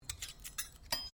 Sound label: cutlery general movement, Household cutlery; Kitchen Sound Effects; Kitchen (Sound Effects:Household)